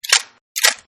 Gun Clip Takeout, Generic Gun Ammo Clip Pullout Sound; Gun Handling; Guns; Michael Huang